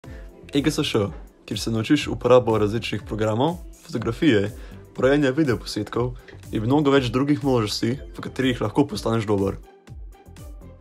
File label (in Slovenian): vokal